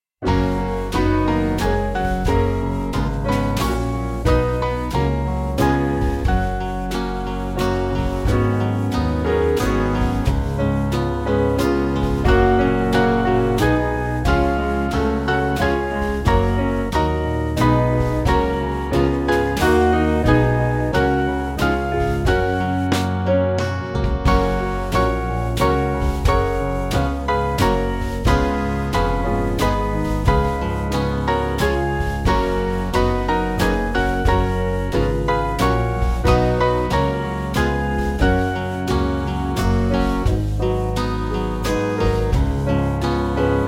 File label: God Save, National Anthem, Band